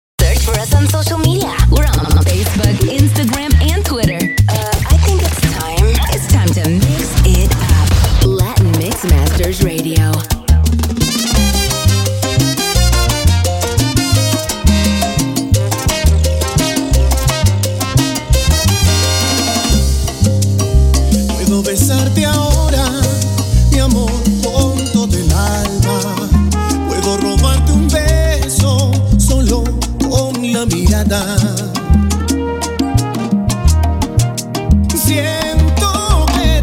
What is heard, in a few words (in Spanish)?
SALSA MIX